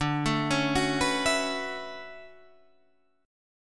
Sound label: D Major 13th D down Guitar Standard; arpeggiated; JGuitar